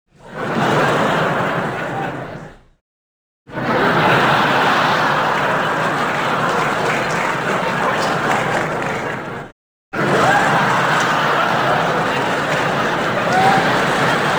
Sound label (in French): Rires; Fin; XBOX; Furious Karting